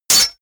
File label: sword hit metal